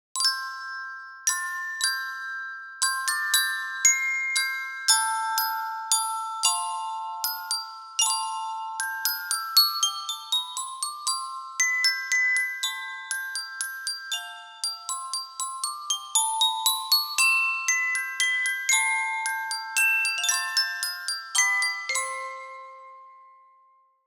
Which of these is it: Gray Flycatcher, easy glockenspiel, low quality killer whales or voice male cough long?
easy glockenspiel